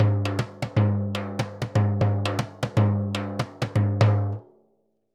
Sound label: Surdo Bomba 120, Drums & Percussion, 120 bpm; Best Service; Festa Latina VOL-1